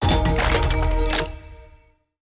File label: Jingle New Weapon Unlock